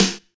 Bright Snare Sample F# Key, steel snare drum sound tuned to the F# note